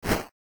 Attack Spin; Robot 64

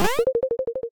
double jump; Robot 64

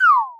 Fade Out; Robot 64

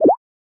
Pole Climb; Robot 64

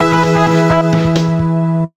Icecream Gate Open; Robot 64

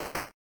Attack; Robot 64

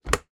Button Release; Robot 64

